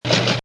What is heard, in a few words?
tty carriage return, ASR33; Macintosh